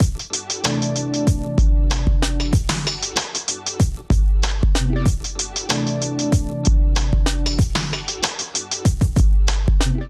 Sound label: Kit Melodic 95bpm, Drums; MIDI Clips; Allee Gate; Ableton; Chop and Swing